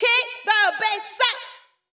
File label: VOICE GRL HOUSE 125 A, House Voice